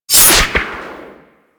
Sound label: Light Glaive Throw; Warframe